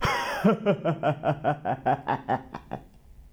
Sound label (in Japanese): waruiwarai; 悪い笑い; ハッハッハ